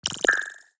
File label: code library open; Ben 10 - Omnicode